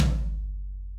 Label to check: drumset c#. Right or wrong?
Right